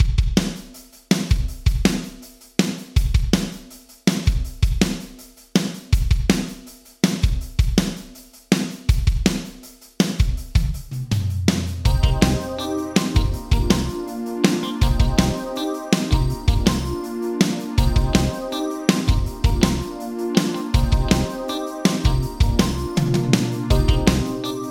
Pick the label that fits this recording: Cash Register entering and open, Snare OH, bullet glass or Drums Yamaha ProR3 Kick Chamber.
Drums Yamaha ProR3 Kick Chamber